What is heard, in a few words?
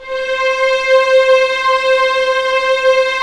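strings, Vls7 p%f, Orch; Roland L-CD702; Vlns 7 Orch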